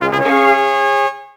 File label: Win